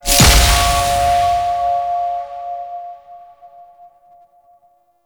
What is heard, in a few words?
Protective Shell Cast; Warframe; Citrine